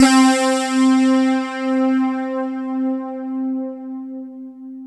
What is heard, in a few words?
POLYSPLIT, JV-1080